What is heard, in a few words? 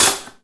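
metallic ringing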